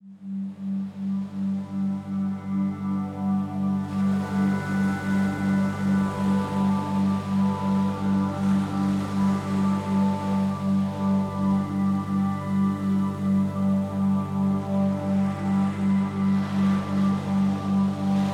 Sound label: Ocean Waves Sleep Aid